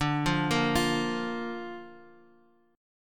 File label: D Minor Sharp 5th D down Bass Standard; Dm#5 arpeggiated; JGuitar